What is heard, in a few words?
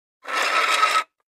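Metal Scrape On Cement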